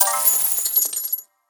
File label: collect money; Butterfly Lovers; wazdan